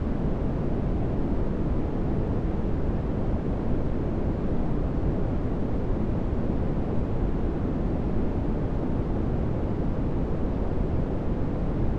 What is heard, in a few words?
wind